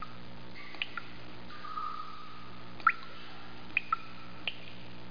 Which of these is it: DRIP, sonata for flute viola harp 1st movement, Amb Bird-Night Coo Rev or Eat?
DRIP